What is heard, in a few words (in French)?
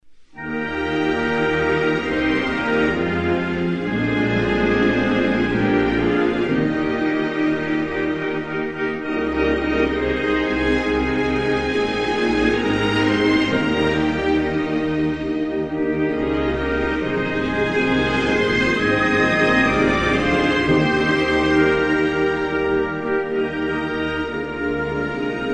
Republique Tcheque, Hymnes nationaux